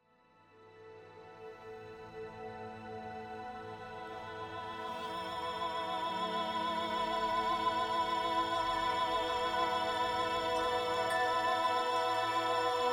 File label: female choir